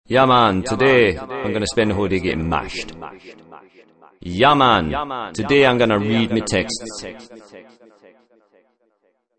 rasta sms

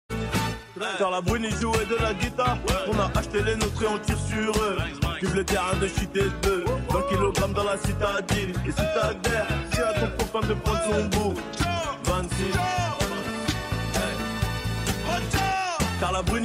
funk

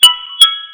LG Q60 Notification

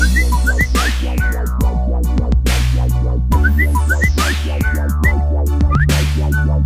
Samsung

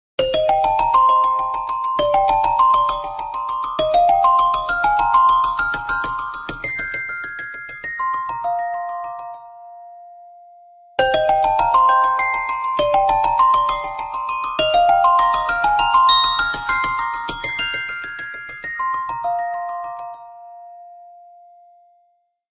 Nokia 6600

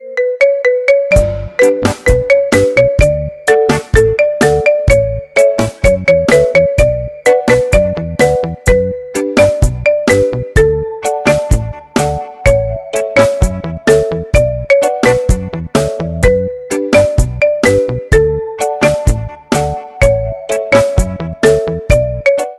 Calma Marimba

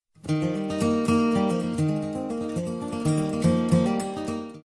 Bluegrass Guitar

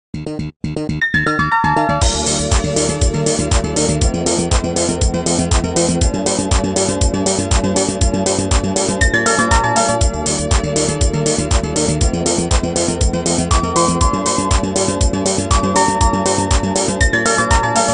Nokia C210

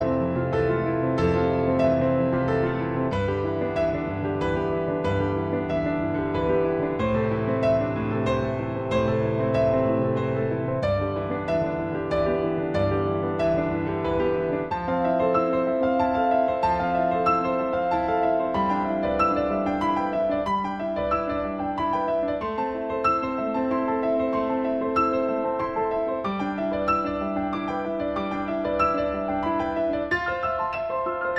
Piano; Interstellar

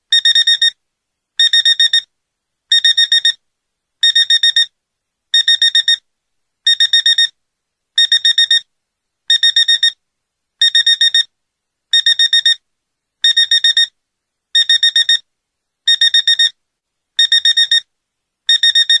Alarm Clock Sound; Effets Sonores

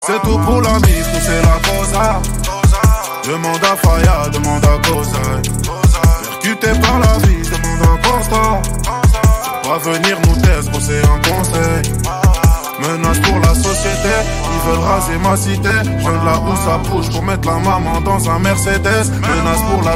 Cello

